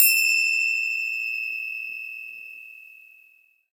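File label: finger cymbal; ILIO; Synclavier Percussion 2; World & Orchestral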